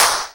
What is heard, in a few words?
Claps & Snares